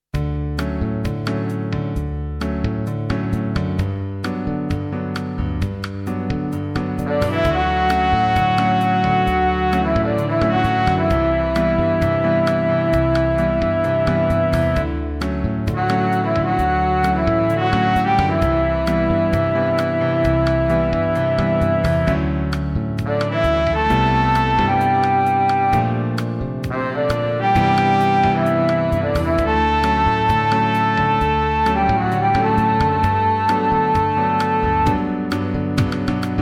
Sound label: minus maracas